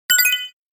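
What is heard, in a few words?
button release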